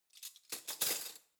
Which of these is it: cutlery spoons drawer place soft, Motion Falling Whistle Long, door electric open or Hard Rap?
cutlery spoons drawer place soft